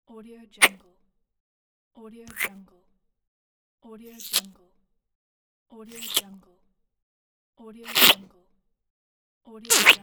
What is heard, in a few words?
kisses